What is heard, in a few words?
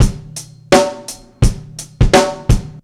84bpm, Hip-Hop Street Beats; Hip-Hop Street Beats Construction Kit; Street Beats by Poogie Bell